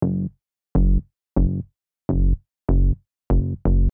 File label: Sub lower midrange bass